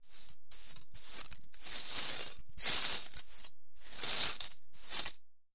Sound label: Sweep Floor Glass Pi